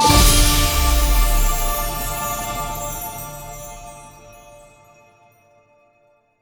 super Mega Win Stop; netent

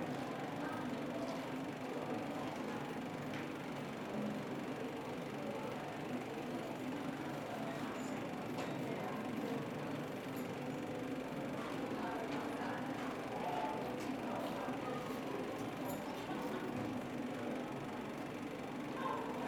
amb FX; netent

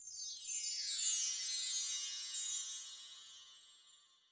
win Chimes

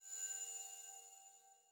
spin start; netent

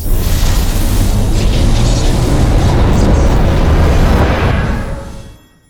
near Win Deluxe; netent

